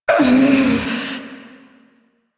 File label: Pain Long; New War